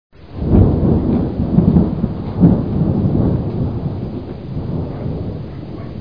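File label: storm empty bin; citystorm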